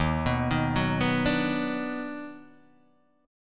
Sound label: D sharp Minor Major 7th Sharp 5th, Guitar Open D